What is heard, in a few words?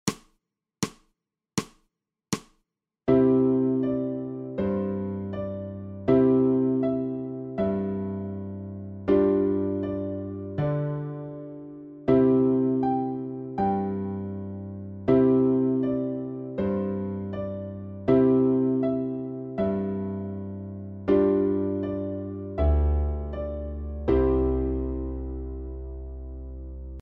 playback alto